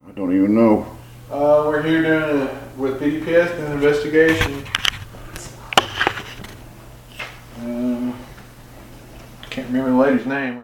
Unexplained Whisper; Carried on Team; (It's fault)

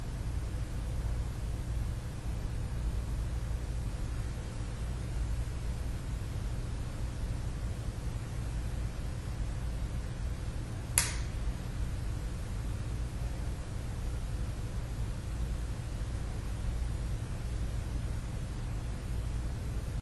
Theatre Stage LOUD CLICK

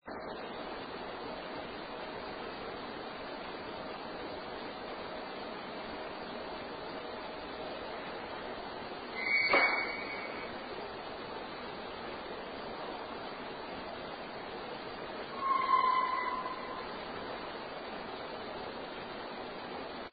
Art Room click; Case 012 Pocahontas Fuel Building 2nd Floor

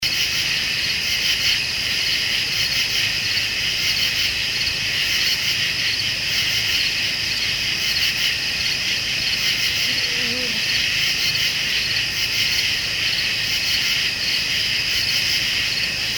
Cemetery someone humming; Case 016 Hebrew-Bryant; or bug

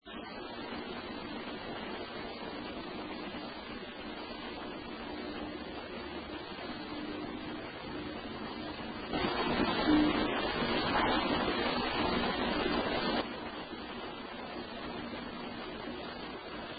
Unexplained Music Note; Mahood Hall Bluefield State College; 1st Floor

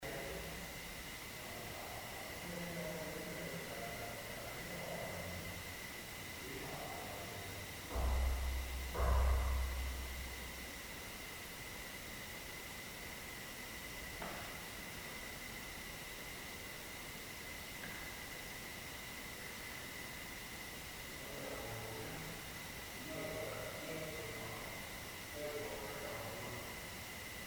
Fuel Building Third Floor knocking and response; Case 024 Pocahontas Fuel Building II

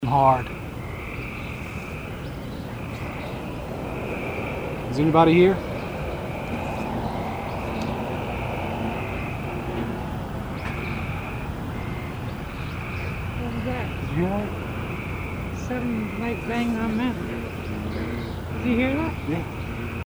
Unexplained Click; Case 022 Lake Shawnee Park Ferris-wheel

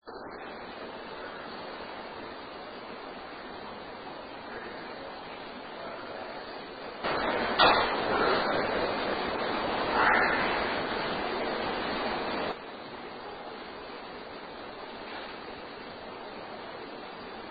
knock; Case 012 Pocahontas Fuel Building 2nd Floor Art Room

